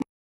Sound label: put; 2048 plus